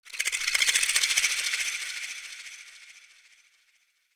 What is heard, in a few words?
Snake Rattle; Ambience; RedNight